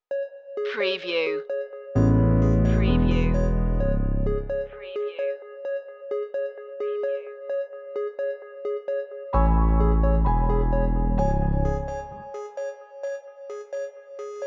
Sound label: no drums or sound fx